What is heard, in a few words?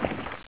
draw, weapons; charger7